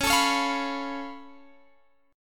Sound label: Db Augmented 7th Db Guitar Drop D